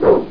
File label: Menu Popup; Age of Empires